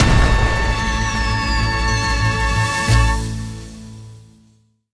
Free Spin Enter; colossal pinatas

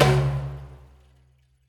drum End; spingle; wild bazaar

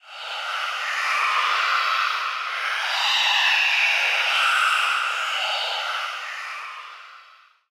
heartbeat; superstriker

